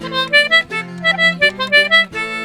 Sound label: C6 R, Celtic Flavours; USB Soundscan vol.42; AKAI